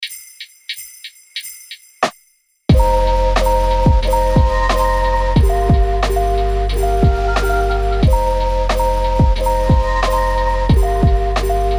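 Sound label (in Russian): уютная музыка без слов для нового года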